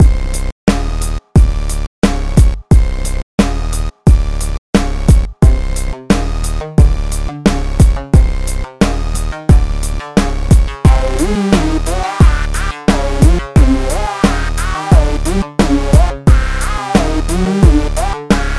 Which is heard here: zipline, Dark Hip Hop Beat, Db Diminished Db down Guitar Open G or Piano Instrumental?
Dark Hip Hop Beat